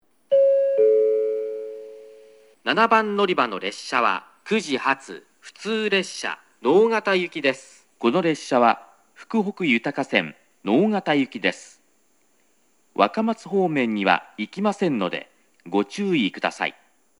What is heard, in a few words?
orio local nogata